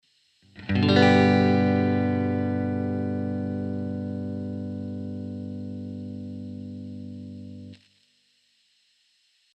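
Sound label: G low, guitar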